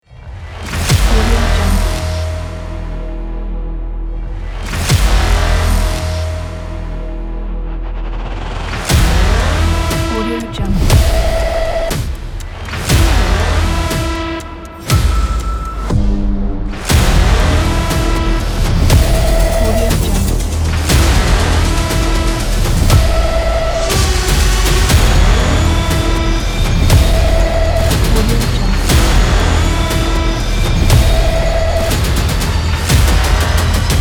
hybrid aggressive trailer teaser; goodideaproduction

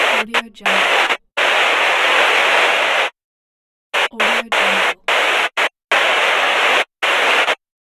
the walkie talkie noise

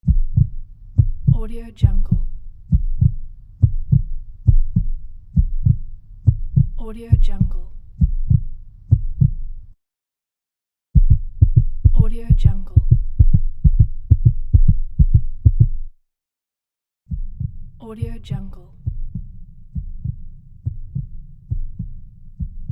heart beat

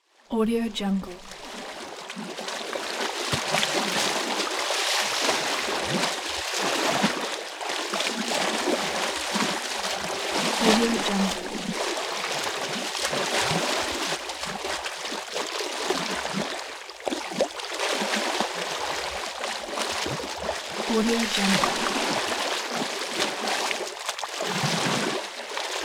water splashing; capeaudio

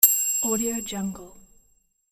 ding

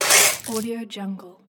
spit out water

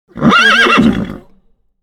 horse whinny

